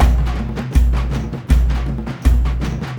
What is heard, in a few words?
AF TRANCE; Spectrasonics; Heart of Africa 2